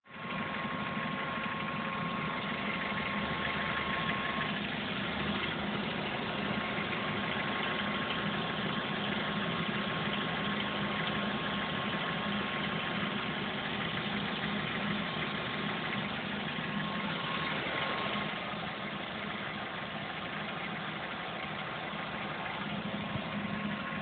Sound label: weird noise, Mazda3; diagnosis weird noise